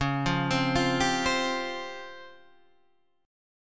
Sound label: Db Major Sharp 11th